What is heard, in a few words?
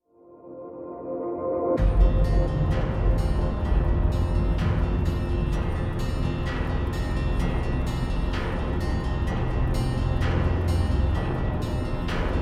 no rock drums